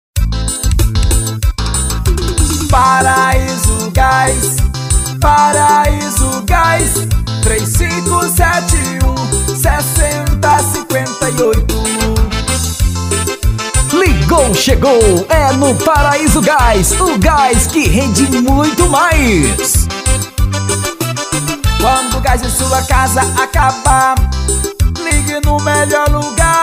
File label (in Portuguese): jingle paraiso gas; studio jary mix